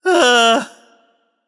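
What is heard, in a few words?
nonverbal ugh; smite; voicepack; merlin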